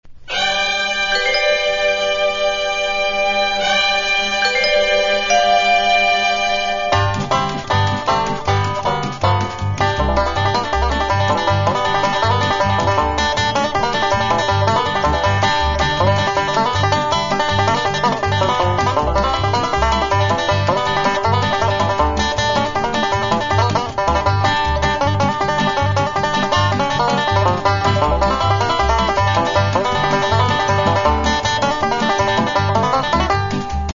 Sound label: Banjo Allegro